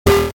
Multimedia Internet CD Rom Flash Buttons Electronic